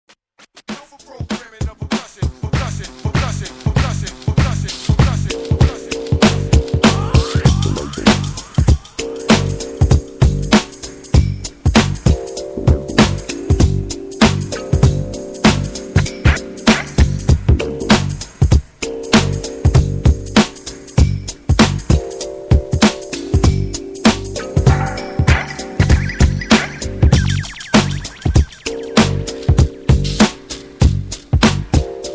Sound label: programmed percussion